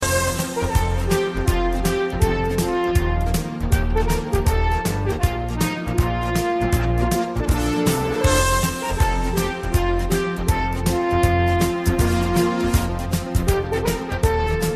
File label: Deck The Halls